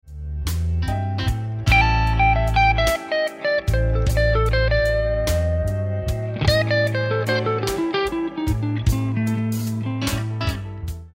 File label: Lick, guitar; Beginner/Intermediate licks; Blues Guitar Method 1